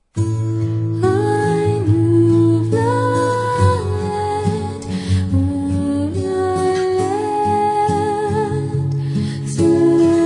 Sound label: Acoustic